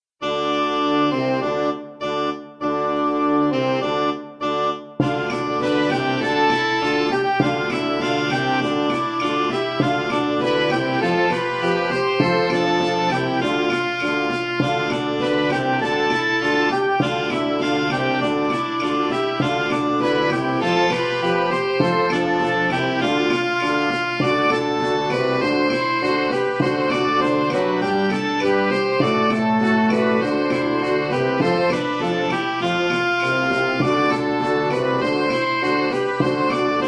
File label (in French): branle de sant blase, Hautbois; Rencontres Hautbois